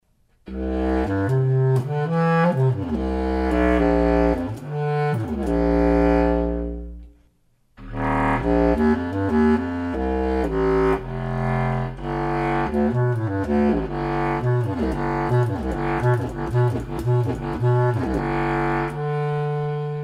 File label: Contralto Clar